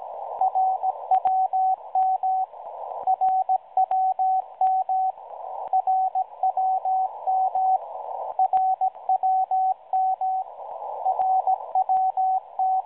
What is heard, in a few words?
websdr RWM ID 9996kHz